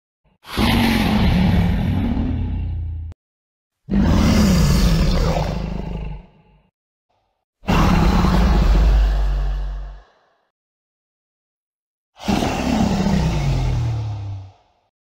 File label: Ender dragon; ماینکرافت